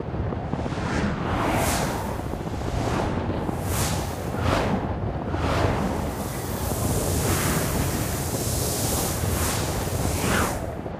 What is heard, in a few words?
skydiving; Secret Agent